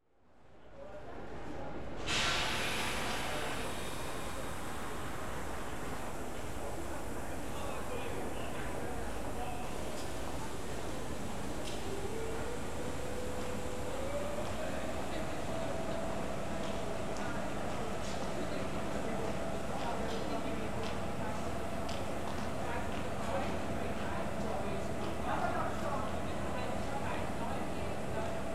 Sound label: Germany, Through Station, Trains; European Soundscapes; BBC Sound Effects Library